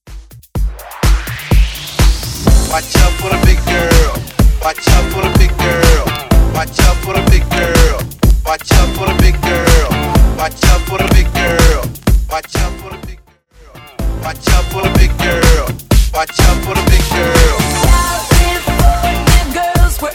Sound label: Pop Redrum